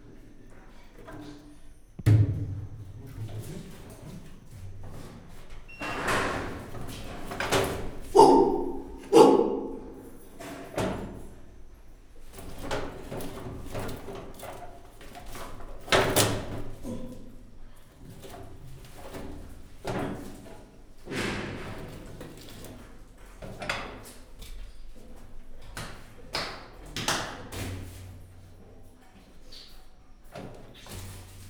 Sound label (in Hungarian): bot rácson kidugó huhogó majom, csimpánz; gyori zoo 2014